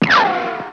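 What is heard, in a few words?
RICOCHET, WEAPONS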